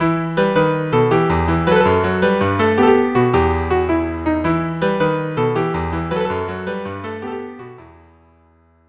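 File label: viola cello; Marais; March pour les Matelots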